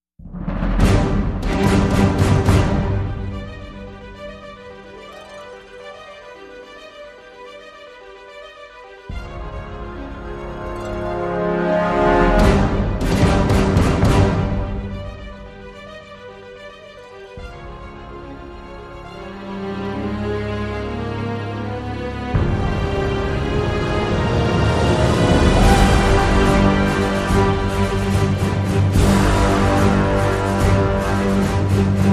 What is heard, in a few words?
No Choir and No Theremin